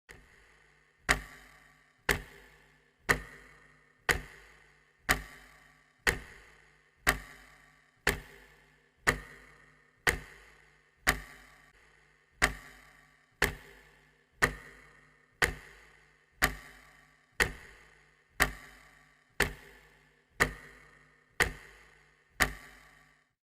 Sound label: clock ticking